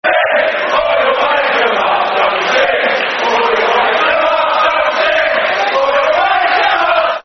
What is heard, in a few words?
Don't Sing Or You'll Wake Them Up, Football Chants (Soccer Songs); Portsmouth